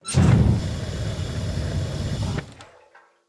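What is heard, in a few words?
Weapon incinerator idle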